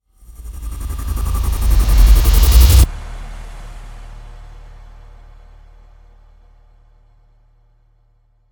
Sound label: Continue Stinger; Curved Space; Switch gamerip